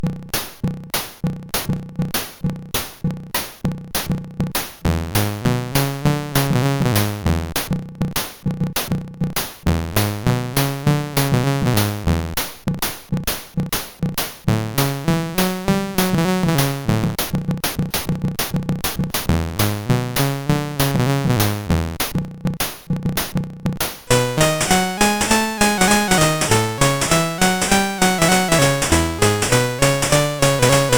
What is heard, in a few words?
CSG8580R5, GAMES; Street Rod